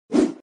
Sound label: Sfx Enemy Skeleton Attack